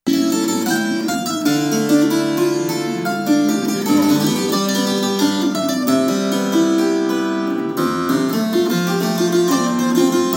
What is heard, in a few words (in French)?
clavecin